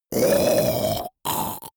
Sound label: monster choking zombie dying